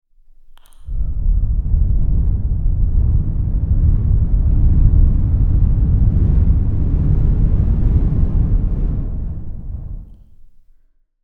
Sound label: wind